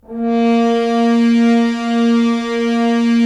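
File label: Solo French Horn; Roland - LA Composer vol. 4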